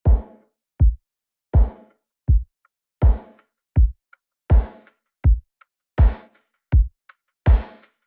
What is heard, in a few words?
Drums